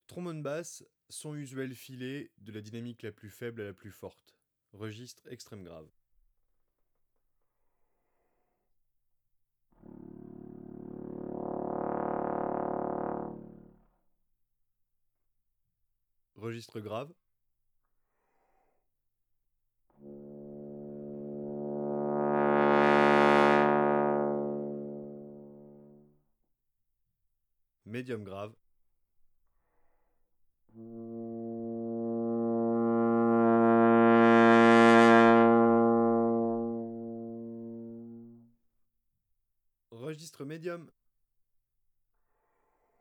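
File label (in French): basse sons usuels filés, trombone; Textures; Sons lisses; traite orchestration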